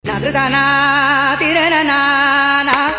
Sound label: Funny Msg Tone; Goa